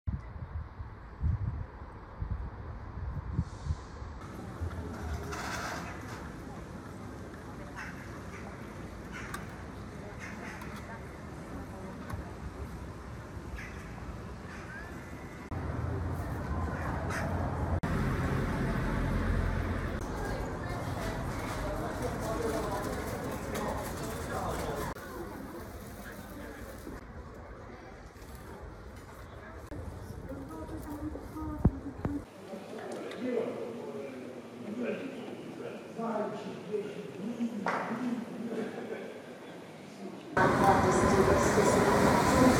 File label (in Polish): efekty ulica pociag autobus rynek